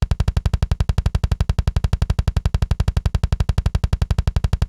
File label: drum, studio drum recording